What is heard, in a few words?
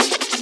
Loops Fills; Vengeance - Essential Clubsounds Vol.2